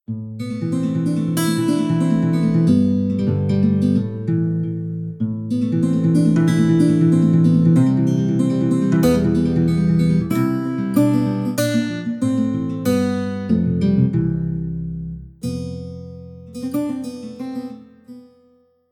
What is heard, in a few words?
Flamenco, phonetones ringtones; KONKA; SP6